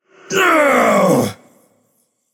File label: ouch; smite; vamana